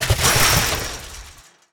objects, World of Tanks

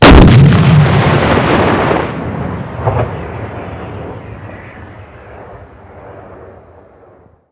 Vehicle Weapons; Red Orchestra 41-45

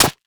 bone knife strike; Rust

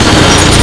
turret mgun start stereo

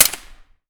p226 fire silenced distant; Escape from Tarkov

